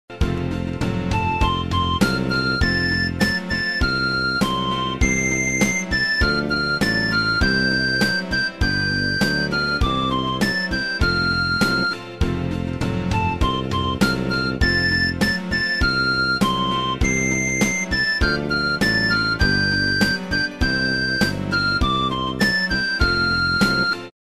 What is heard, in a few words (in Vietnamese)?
nhac khong loi